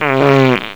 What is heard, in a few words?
fart